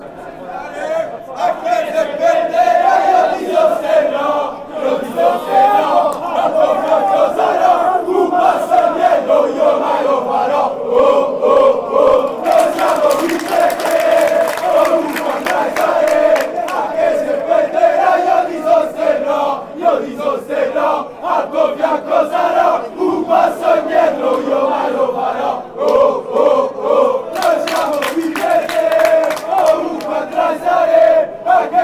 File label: woah woah, fan chants; lazio